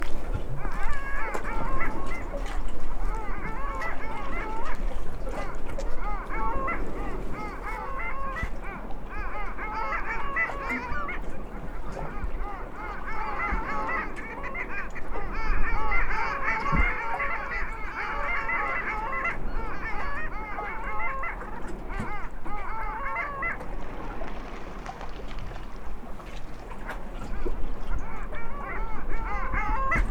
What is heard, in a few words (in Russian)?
moryanka clangula hyemalis; utok